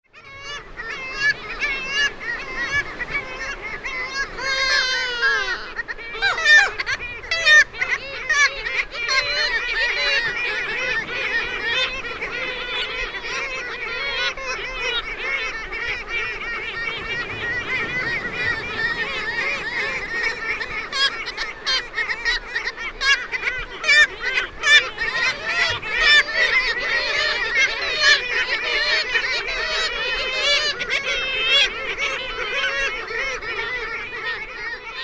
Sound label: kittiwake